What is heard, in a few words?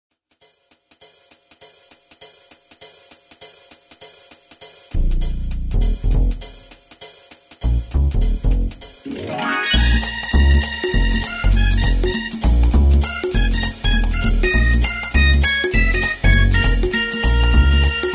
groove organ bass